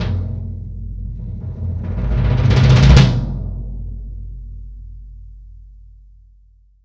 GONG BASS

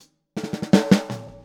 Boogaloo Snare, 82 BPM; Sonic Foundry (Sony Creative Software); Drums from the Big Room The Mixes

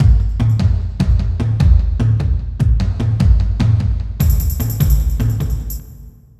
Industry, Percussion

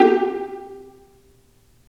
Vas Pizz, String; Vlas Pizz; Roland - String Master Series

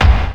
TURBO KICK

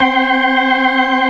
organ, all stops; Keith Emerson Signature Series

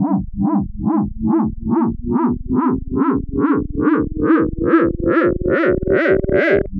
Noise, LFO & Noise

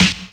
SD, Dance Mega Drums; Best Service

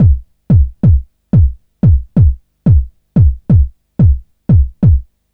Kick Loop, Drum & Bass

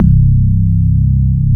DX PIANO; East West; Twisted Textures-BT

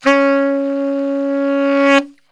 C#2 SAX, Brass Super Section; Best Service

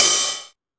Techno, EMU XL-7

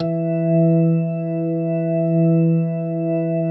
E3, PERCORG; Sonic Reality; Vintage Timetraveler

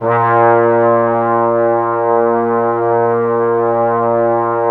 trombones A#3; Q Up Arts; Denny Jaeger Private Collection

